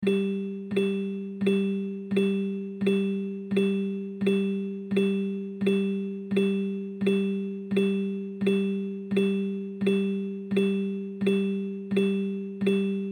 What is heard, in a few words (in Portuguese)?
amostra de pulsos com periodicidade